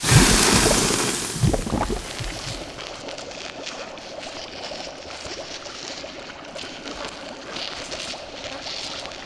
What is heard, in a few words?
acid spray; weapons; dota; alchemist